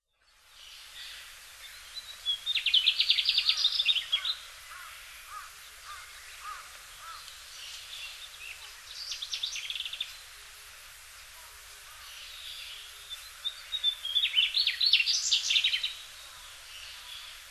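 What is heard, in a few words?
mugimaki flycatcher, hyogo; koe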